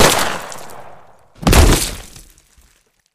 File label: santa shot n falls; fridaynightfunkin; week5